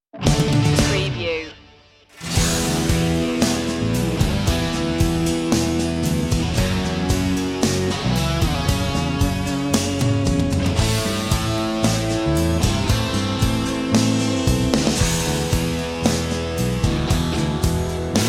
instrumental

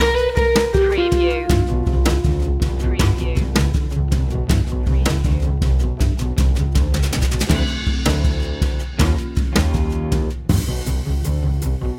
fast and jazz loop

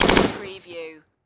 Machine Gun Bren

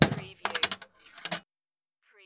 Pinball sound, clunk followed by various rattles

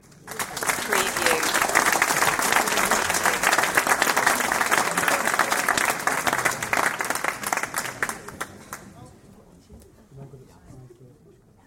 Audience Applause; beanstalk audio

